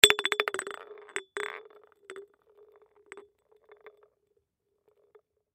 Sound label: Christmas Glass Ornament Drop Bounce; foley; Holiday Sound Effects; Blastwave